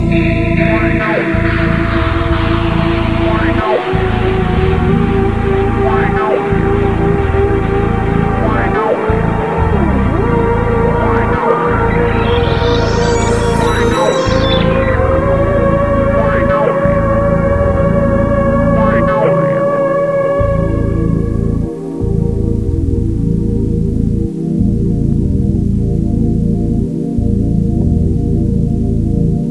bg music intro; svencoop

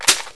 ak100 mag in, weapons; svencoop

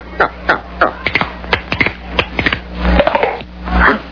nyuk

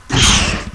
hunter jump; svencoop

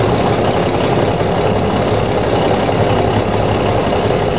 semi idle; svencoop

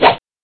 slash, katana; weapons

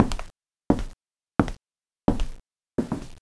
steps; beerdog

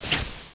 radio deploy, weapons; cstrike

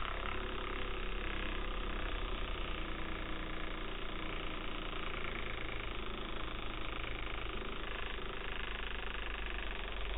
table fan; svencoop; fnaf

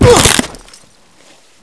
player fall pain

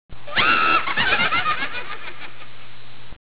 other laugh

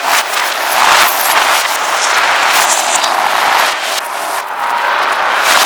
flesh noise loop; svencoop; ambience

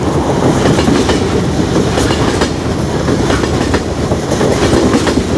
train freight loop

